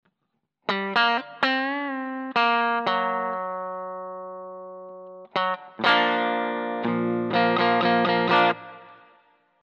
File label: bridge hb neck ph